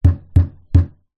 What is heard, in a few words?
impacts wood wall hit hand thud x3; zapsplat